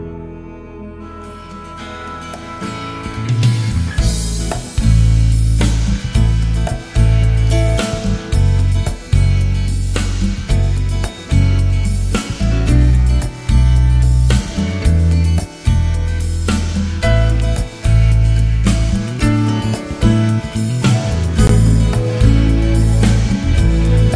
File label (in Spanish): Es Mi Cristo Pista; cantata semana santa; pistas playback